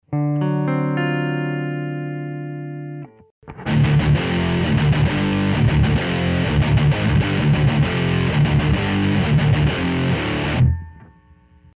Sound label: heavy PRS dirty